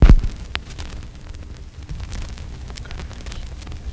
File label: VINYL NOIS; USB Soundscan vol.02; Underground Hip Hop; AKAI; AMB.FX